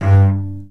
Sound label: short strum, cello